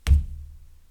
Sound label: Floor bang